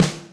snaredrum, Yamaha RM 50

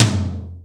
Tom L, Yamaha RY-30 Toms; Drum Samples

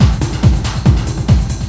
tribe drums, audio loops

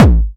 Harder, kicks

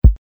kick

